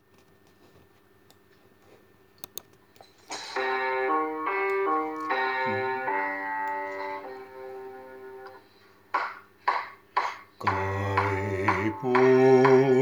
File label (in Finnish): Syysunelmia tenori